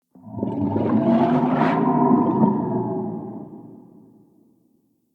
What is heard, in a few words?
Intestine Gurgle; Ambience; Bromius Inside